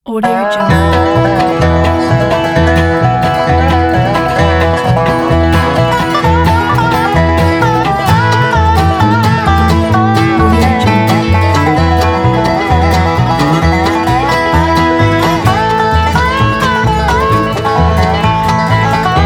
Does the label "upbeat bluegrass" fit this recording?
Yes